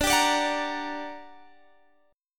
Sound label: G Minor Major 7th, D, guitar chord